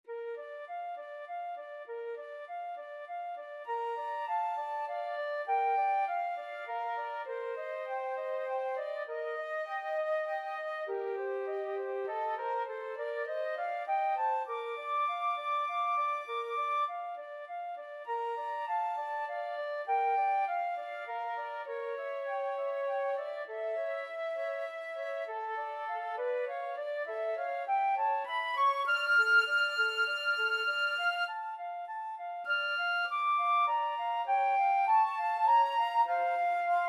saint saens swan, flute duet

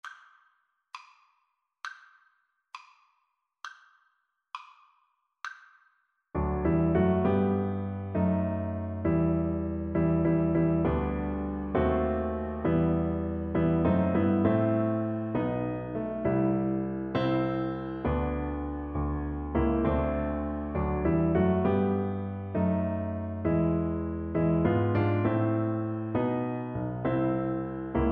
lift every voice, Viola

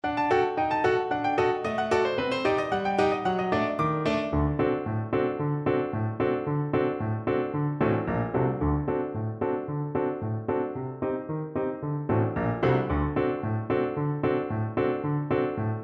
flaskadriga BN, Bassoon

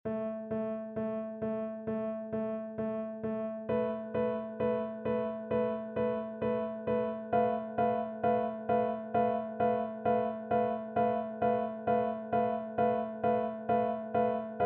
winter 1st, Flute

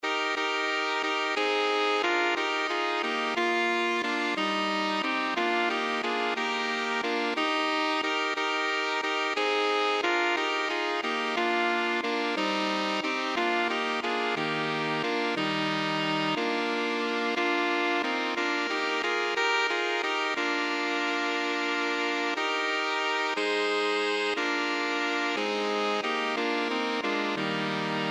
flexible mixed ensemble, 4 players

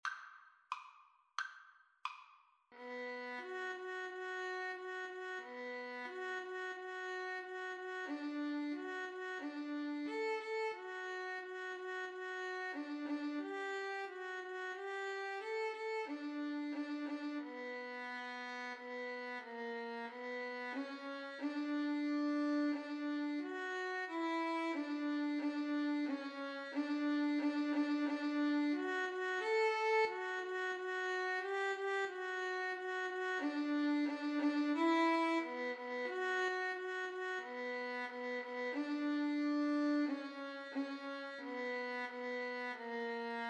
pavane violin

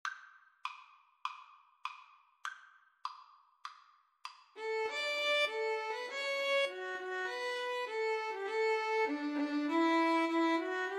national anthem russia, violin viola duet

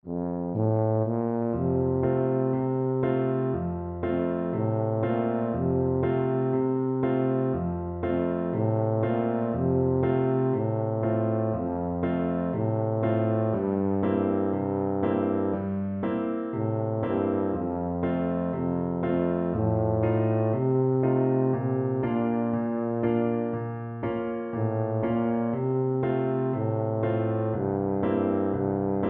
when the saints, tuba

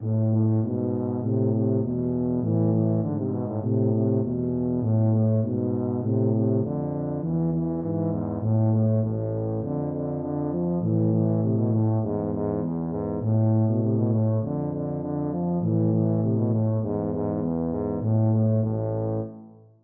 Sivivon TBA duet

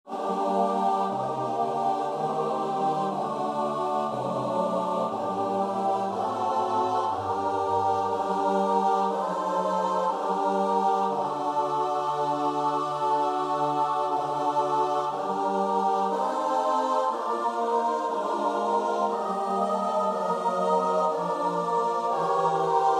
st john o grosse lieb, choir

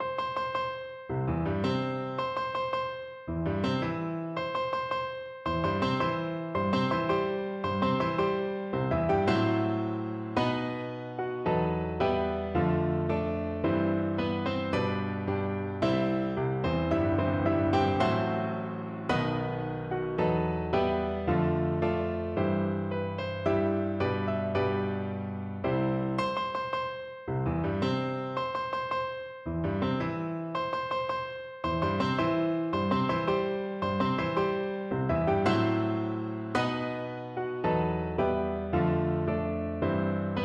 wedding march piano duet

